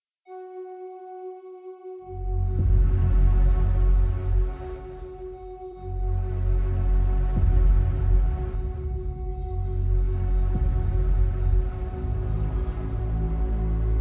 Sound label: Mystical Forest movie trailer